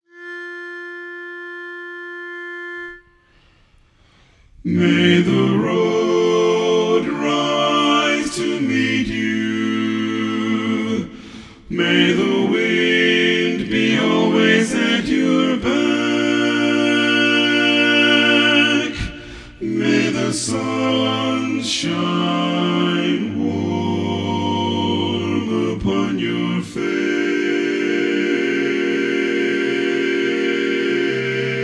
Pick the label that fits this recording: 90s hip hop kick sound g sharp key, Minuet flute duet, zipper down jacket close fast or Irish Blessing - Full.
Irish Blessing - Full